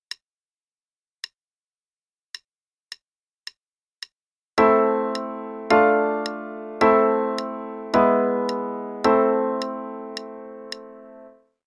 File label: a minor chord progression; Level 2; qn=108